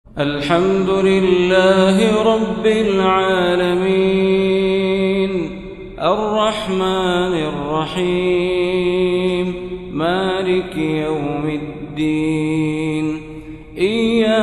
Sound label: hejaz, maqams; the eight maqams